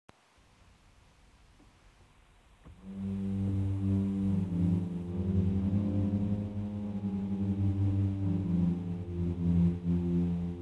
Diapasonreihe 16 8 Open Wood 16, Orgel

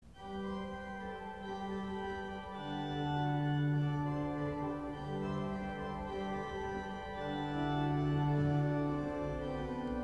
Prinzipal, Orgel

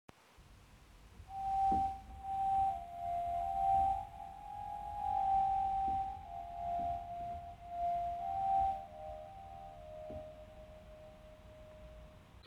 Bordunreihe 16 8 4 Stopped Flute 4, Orgel

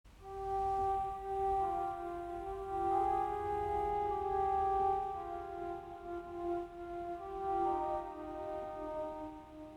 Diapasonreihe 16 8 Diapason 8, Orgel

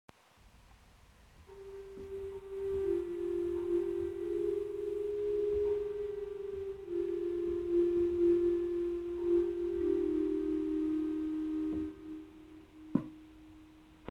Bordunreihe 16 8 4 Holzgedackt 8, Orgel

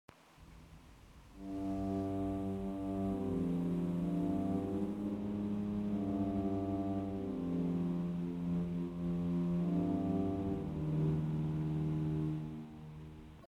Gambenreihe 16 8 4 Contragamba 16, Orgel

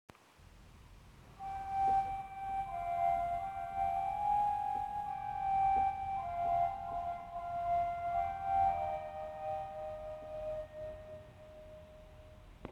Clarabella 4, orgel; Clarabellareihe 8-4

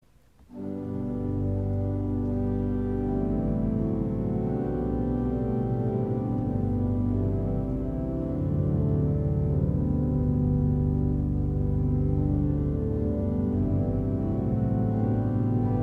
Diapason, orgel

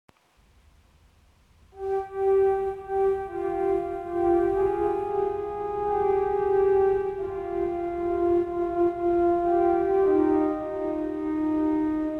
Clarabellareihe 8 4 Concertflöte 8, orgel

